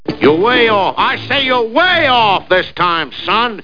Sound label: lose